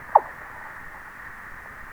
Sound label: normalized, recordings under ice